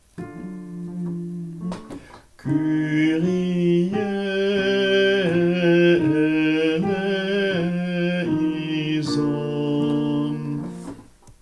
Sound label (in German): Tamb